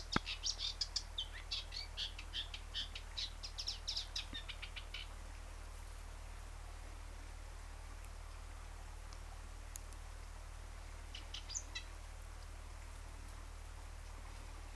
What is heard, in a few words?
Warbler african reed Naukluft